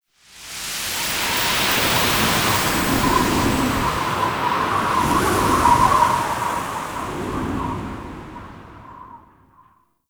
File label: Interrogation Intro Stinger